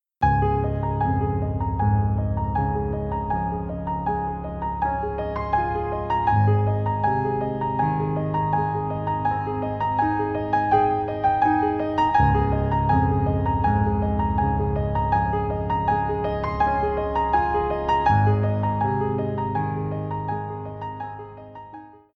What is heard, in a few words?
jingle